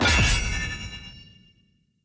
Short Sword Medium Parry Perfect Counter, Weapon Impacts; Player; Swords; Combat